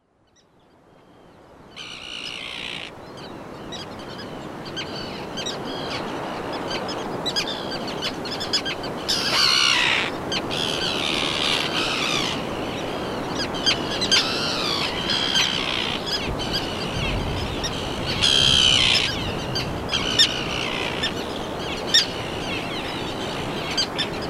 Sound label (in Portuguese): Vocalizacoes, garajau comum; aves marinhas; garajaus